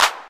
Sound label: short clap D key; single hits one shots